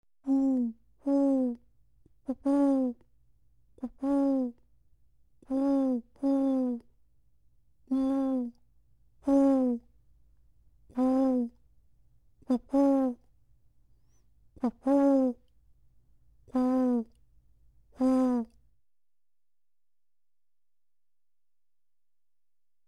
Owl hooting

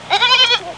Single lamb bleat

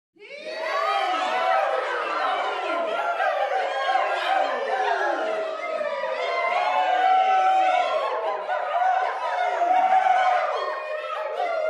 Crowd ecstatic and cheering

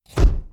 Camera Flash Pot

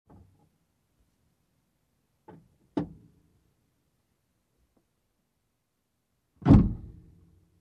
Closing Trunk

